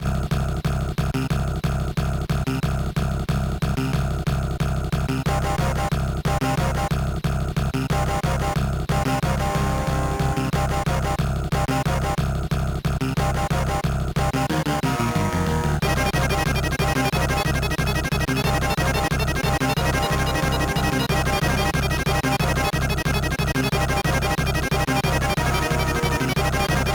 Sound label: BGM; Desert Strike Advance; GBA